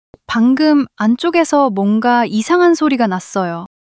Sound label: surprise